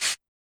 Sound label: Percs